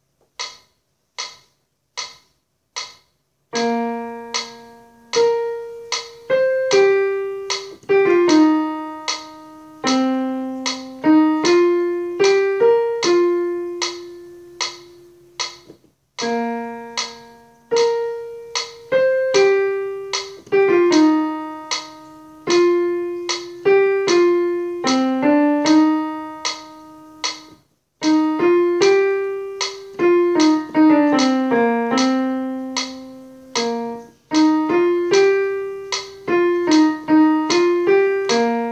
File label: Misty Rain Bass; Accompaniments